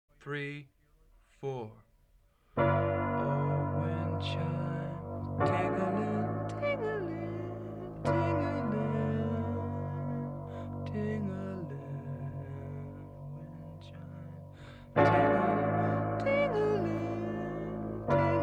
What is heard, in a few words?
Wind Chimes Vocal Inserts